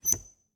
Refridgerator, old fashioned, wooden, steel, brass clasps, hinges, open; Eiravaein Works; Vaeyan IV